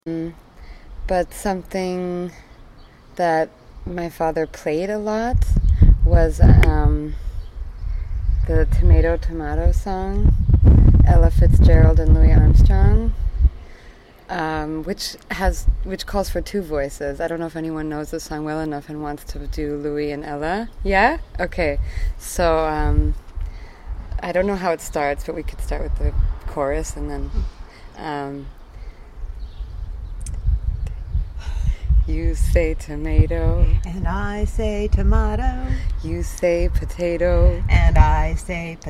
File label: POTATO SONG; HUDSON